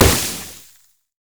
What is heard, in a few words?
caustic spittle; LoL; kogmaw